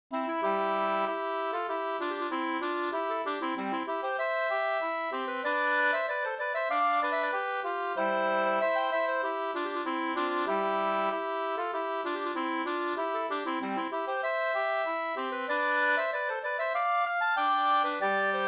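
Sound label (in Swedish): brudmarsch gamla brudmarsch 2 stämmor; Hellstedts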